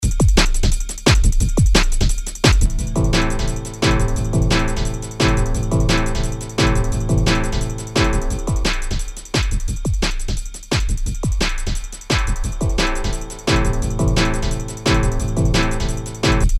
Resonators

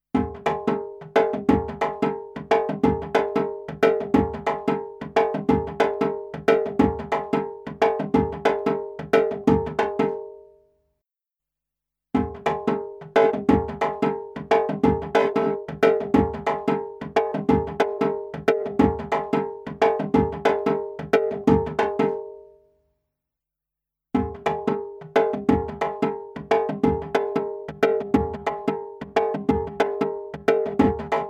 Dholok; DNA; O-Time Attack

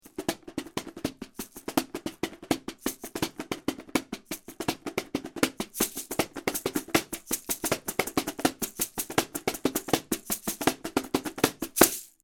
U87 Perc HP

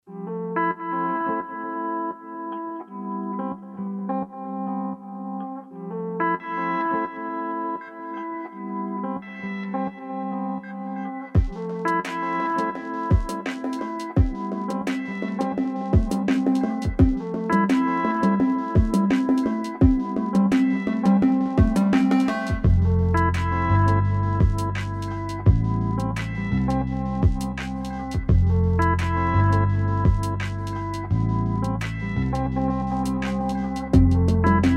Novation Circuit Rhythm

